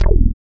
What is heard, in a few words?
BASS, Tekno Industrial; East West